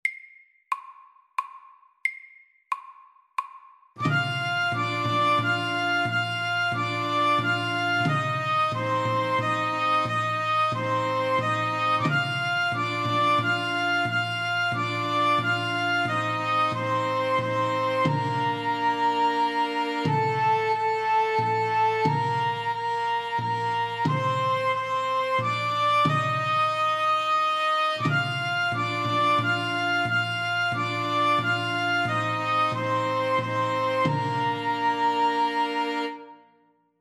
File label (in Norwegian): Irsk melodi SLOW